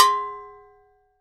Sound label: MAMBO BELL